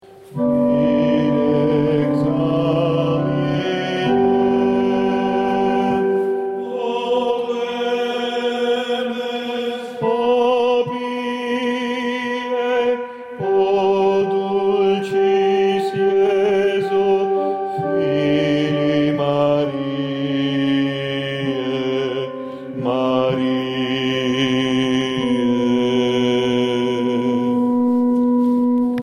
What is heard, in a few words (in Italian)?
Cont Cant; Ave Verum corpus-E.Edgar